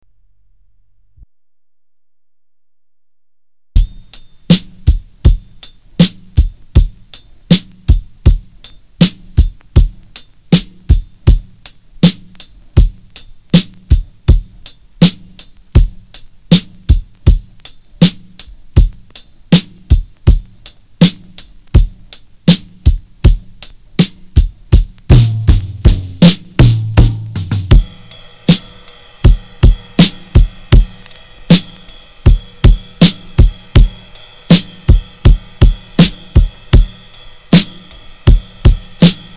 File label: basic drum